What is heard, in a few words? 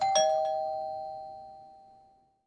Door Bell